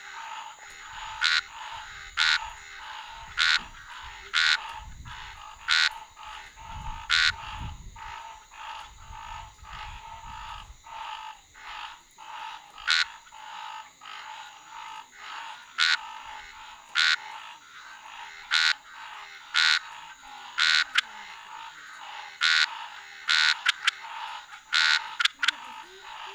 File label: Scinax ruber; Dewynter